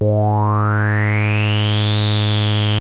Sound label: prophet5 sci fi swell, synths; Zoom - Release 2 (1996); Active Software